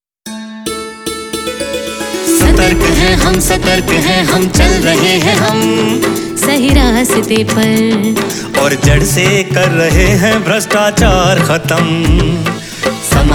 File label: Jingle